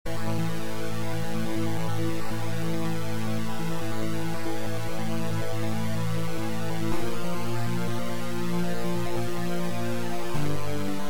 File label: Angry D&B Computer